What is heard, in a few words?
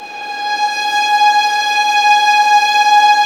STR VLS, String Master Series, Vlns 6 p-mf